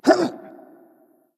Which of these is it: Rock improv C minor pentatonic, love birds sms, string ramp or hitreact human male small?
hitreact human male small